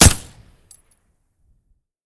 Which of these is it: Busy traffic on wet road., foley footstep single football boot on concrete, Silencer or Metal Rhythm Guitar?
Silencer